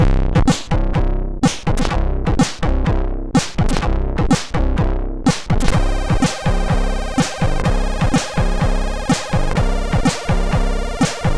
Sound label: sid, MOS6581R2; Sex Drugz and C64